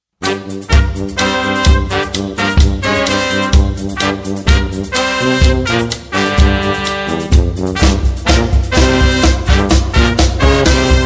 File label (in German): Blech Folklore